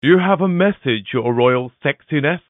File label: message alert ringtone samsung sms